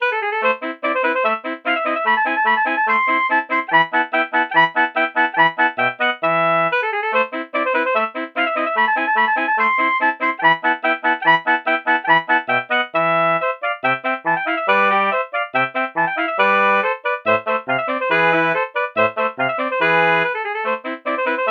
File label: soprano sax